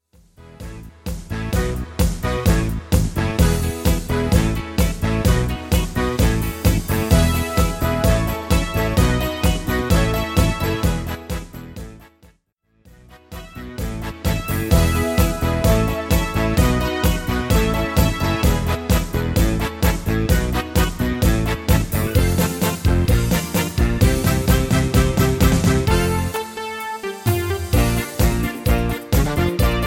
Beat-Party

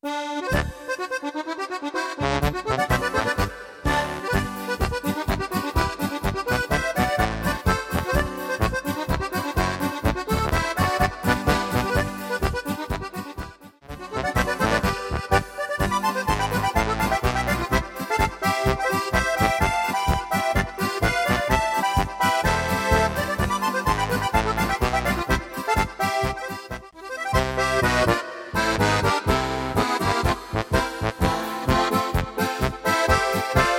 Zick Zack Polka

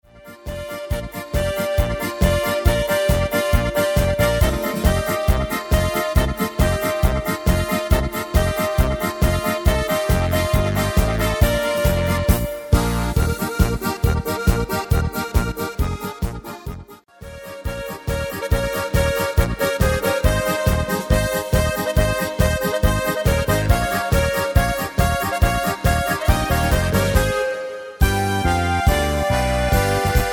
Polka

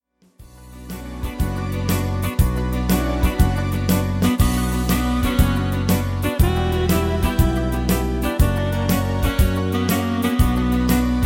Slow-Mix

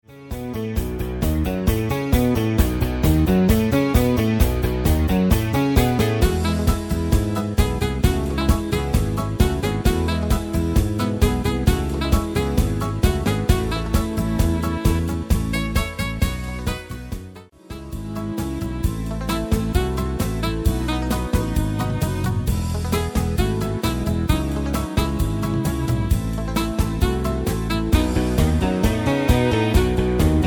60er Beat Hits

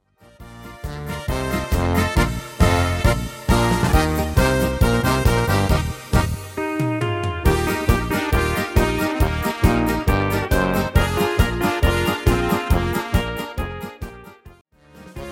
Krainer

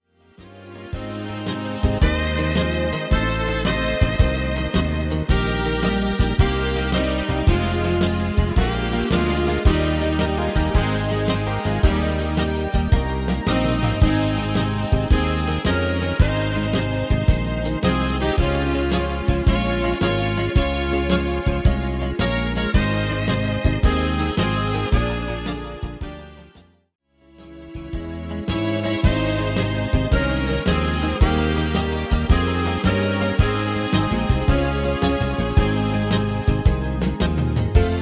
Golden Saxophon Hits

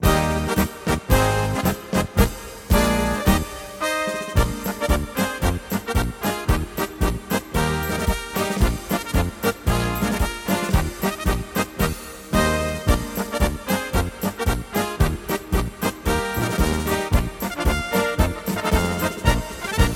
Marsch

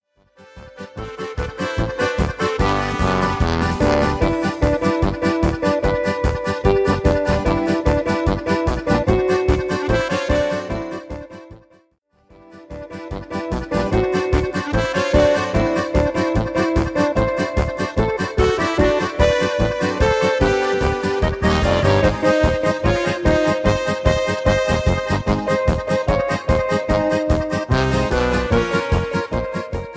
Schlagerkrainer Medley

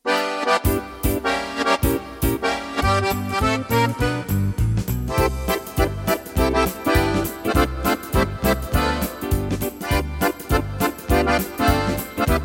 Akkordeon Swing

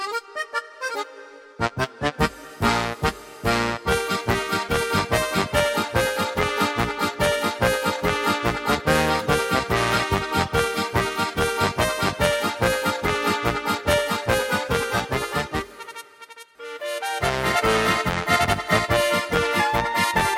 Slowenischer Bauerntanz

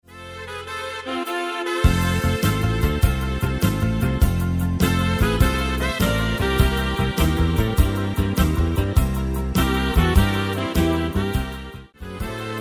Golden Saxophon Hits

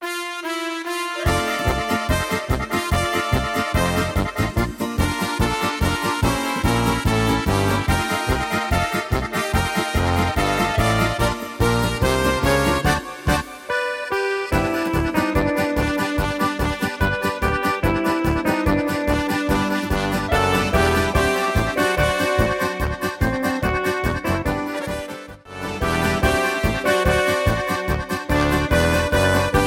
Krainer Schlager-Oldies

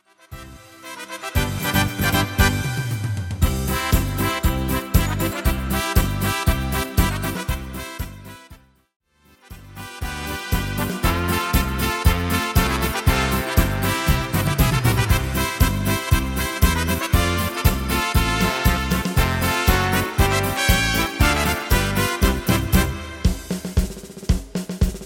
Marsch

